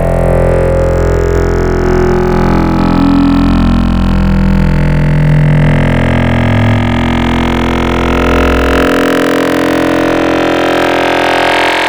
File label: Phased Square, Growl Wavetables; Cymatics; Virus Wavetables; Serum